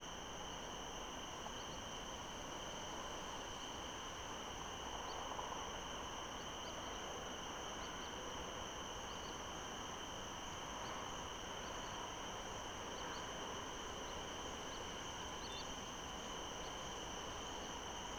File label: flock partial chatter